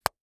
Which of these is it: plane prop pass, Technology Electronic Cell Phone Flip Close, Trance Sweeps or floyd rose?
Technology Electronic Cell Phone Flip Close